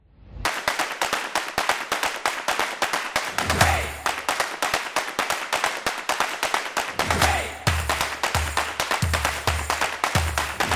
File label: Claps Drums; street dance